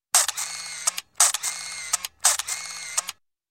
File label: Camera 35mm Automatic 3 Shots, auto Advance; Photography; Sound Ideas 2000 Series